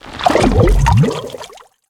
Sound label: designed water texture; BluezoneCorp